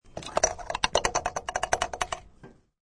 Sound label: Rattling gas pump after pumping to shake off gas droplets.; Gas Pump Rattle 1; Machines